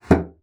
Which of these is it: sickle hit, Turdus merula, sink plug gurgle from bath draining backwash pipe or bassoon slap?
bassoon slap